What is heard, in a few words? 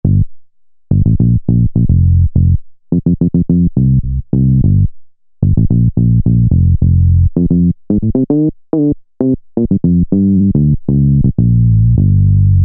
Hood Bass, Roland SR-JV80-06 Dance